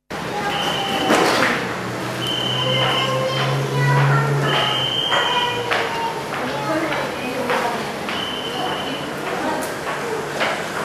tai assorted ambiences, Thailand street recordings